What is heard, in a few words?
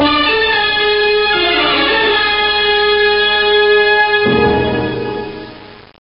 ufo bgm